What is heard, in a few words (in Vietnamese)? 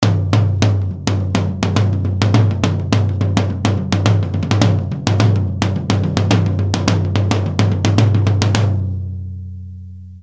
Am thanh trong cang thang